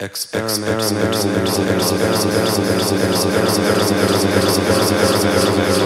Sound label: experimental music